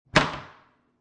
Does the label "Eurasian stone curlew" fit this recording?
No